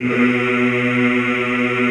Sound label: B1, Classical Choir; Best Service; Extended Classical Choir